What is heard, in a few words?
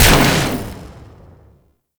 Experiment Pistol Shot; Warframe; Weapons; Corpus